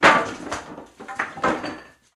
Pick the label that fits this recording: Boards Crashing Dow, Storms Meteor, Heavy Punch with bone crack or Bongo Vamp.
Boards Crashing Dow